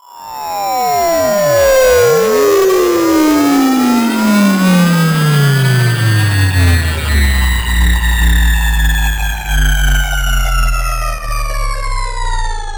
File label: Sweeps Down